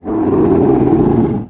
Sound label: Menu Horror